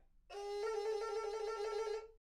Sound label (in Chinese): 伬胡 演奏技法 打音